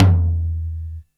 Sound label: Tom Low, Drums, Roland MT32